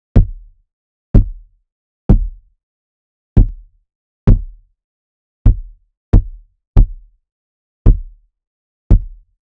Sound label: cardboard box thump, footstep